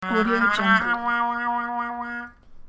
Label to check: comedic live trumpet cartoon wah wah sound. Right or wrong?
Right